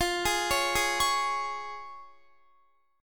F Minor Sharp 5th F down Guitar; arpeggiated; tuning 4E,4A,5D,5G,5C,6F

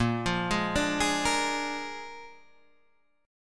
A sharp 7th A sharp, Guitar

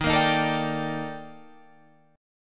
Eb Major Sharp 11th Eb Guitar Drop D

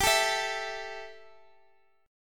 E Minor Double Flat 5th G, guitar

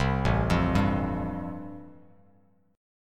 A-Minor 7th Sharp 5th, Bass Standard, chord; Am7#5 arpeggiated; 8,0,3,0; JGuitar

